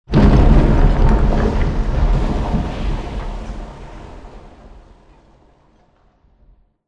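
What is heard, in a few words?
Explosion Rumble Interior Distant Texture; Boomtown; Titanfall 2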